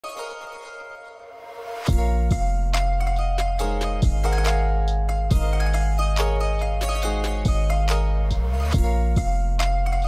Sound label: bgm trap remix love